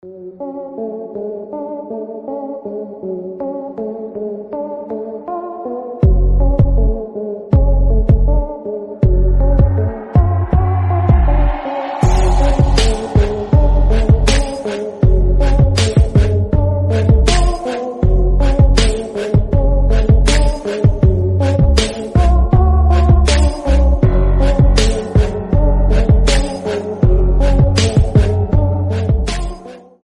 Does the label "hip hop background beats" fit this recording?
Yes